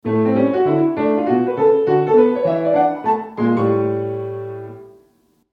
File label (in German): Klavier